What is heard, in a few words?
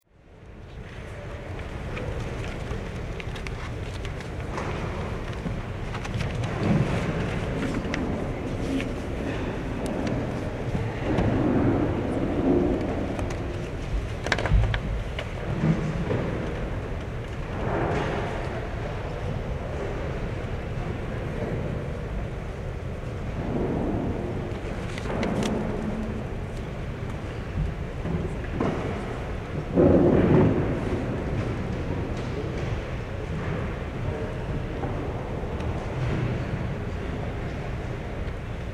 readingroom